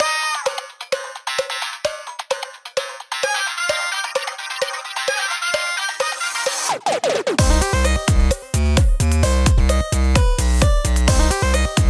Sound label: Portal Power (Instrumental); Friday Night Funkin' Super Mario Bros. Funk Mix